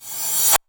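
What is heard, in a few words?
TR909 Crash Reverse; Roland SH-32; Drumset 03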